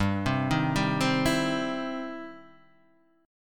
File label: E Minor Major 7th Sharp 5th G